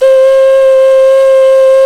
D OCRNA, CLAYFLUTE

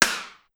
Rim Down, Pitch Env Various, Clavia DDrum; Clavia DDrum-AT

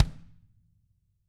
Tama kick; ILIO; Double Platinum Drums 2

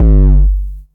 HD BD, Hard & Loud Techno; USB Soundscan vol.01; AKAI

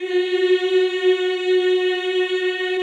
CHO F#3 Eeh; Eeh + Ehh

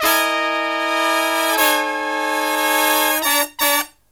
Brass Riffs

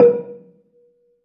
bela A#3, belafon; USB Soundscan vol.60; AKAI

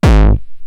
Roland TR-909 BD; BD Selection

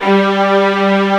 STRNG G2

